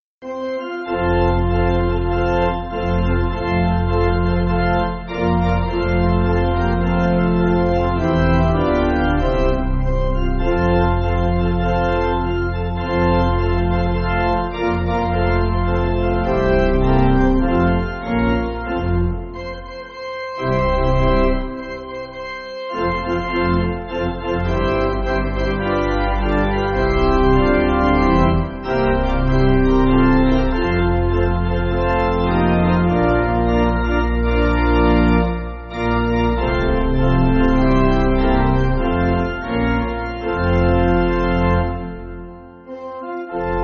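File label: Pipe Organ, Music Backing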